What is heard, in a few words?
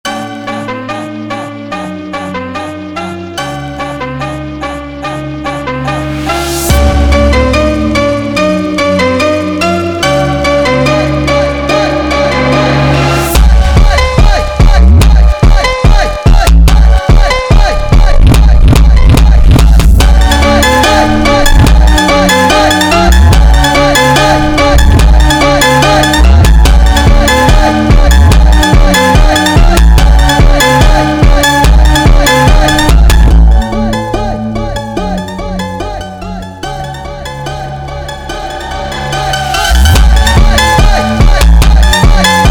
Funk sped Up